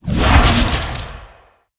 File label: worm whoosh